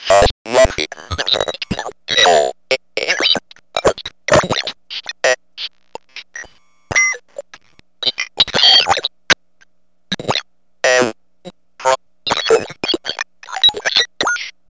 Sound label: FART MONKEY; lolicore; FEVER DREAM STUDIOS